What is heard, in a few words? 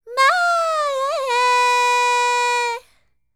World Voices, INDIA; ILIO; Vocal Planet